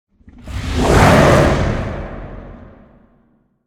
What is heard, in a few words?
Symbol Lion; divine megaways